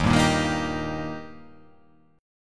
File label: D sharp Major D sharp, chord